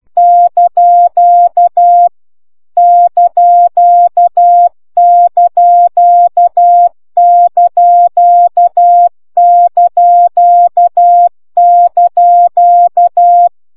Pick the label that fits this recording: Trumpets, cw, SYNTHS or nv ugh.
cw